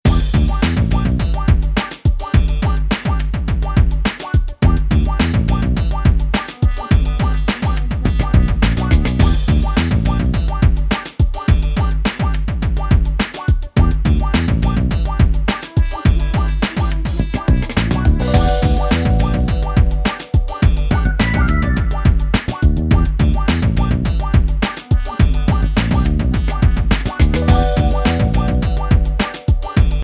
Off Melody

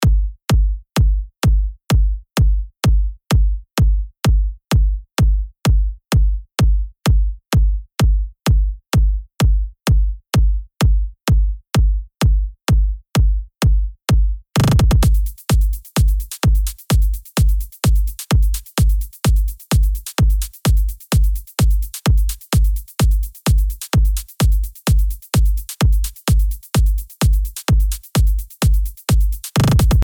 RHYTHM FX

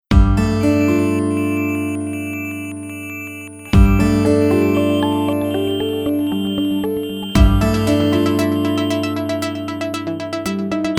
Foggy Forest (guitar); NEWS-STRAIGHT NEWS 1

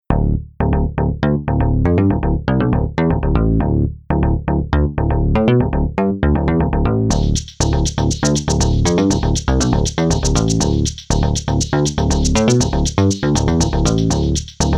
Sharp Beat (bass), NEWS; NEWS-STRAIGHT NEWS 1

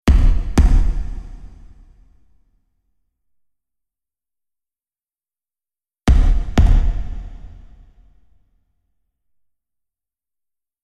only bass

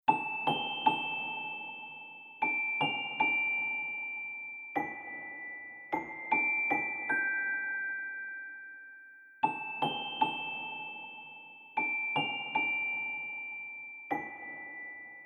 only melody

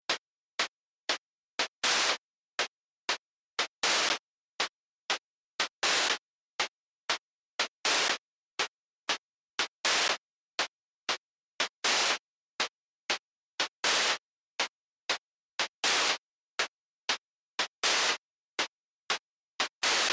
only bass

